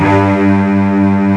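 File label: pop strings G4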